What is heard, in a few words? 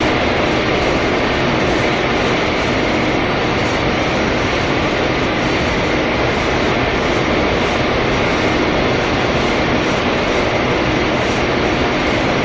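Steel Mill Galvanizing, factory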